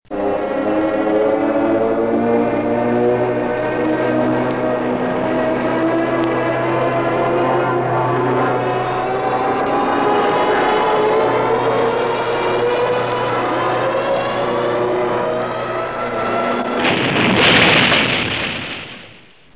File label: Kamikaze, aircraft sounds